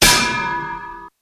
anvil; TF2Maps

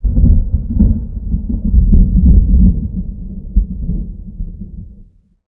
thunder; minecraft; ambient; weather

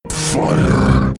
FIRE; TF2Maps; chillskies

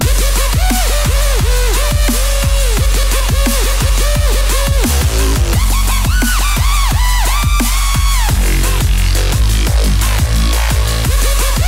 drop; TF2Maps; chillskies; wubwubwub

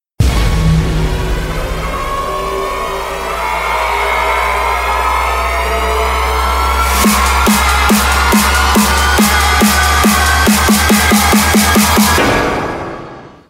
buildup; TF2Maps; chillskies; wubwubwub

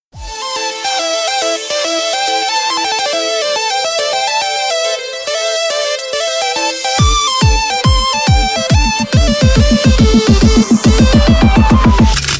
buildup; TF2Maps; chillskies; wubwubwub

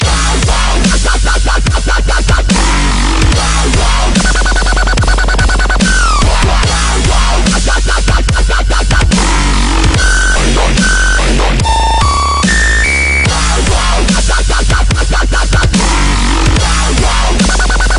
Drop, wub wub wub; TF2Maps

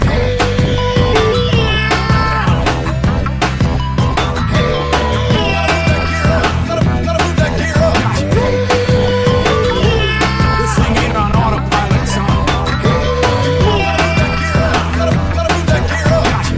conga sketch 167bpm; congamod

